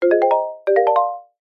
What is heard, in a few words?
multimedia game tone marimba positive generic sequence